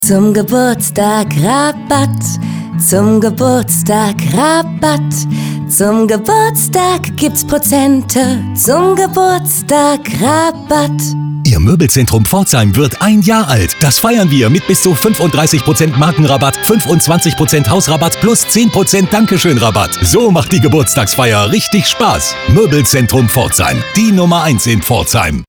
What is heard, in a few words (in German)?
funk spot gesang